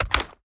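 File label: sniper reload fast end; gmod; iw8; xmike109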